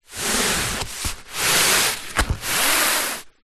sport exercise mat foam thin shift slide scrape movement on carpet